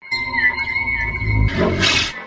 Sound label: scifi spacetrucker, 105 bpm, drum loop; 101 to 110 bpm